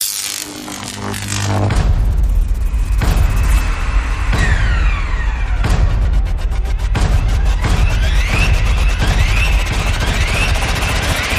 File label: Rise Complex